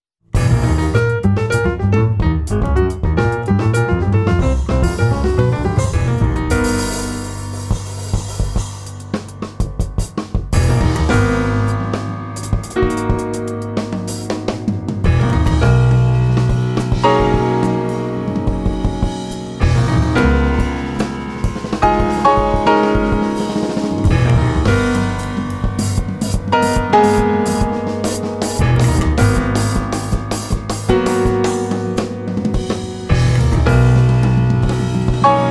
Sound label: Interlude